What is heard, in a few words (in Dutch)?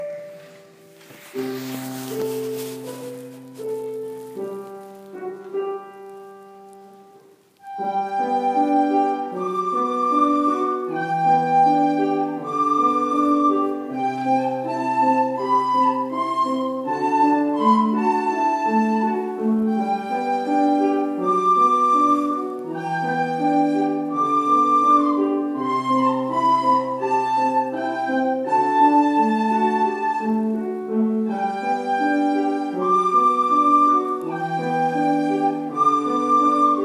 speelt blokfluit